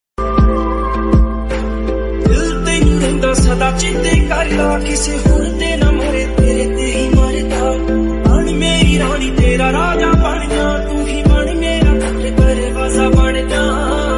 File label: lofi hindi ringtone slowed reverb ringtone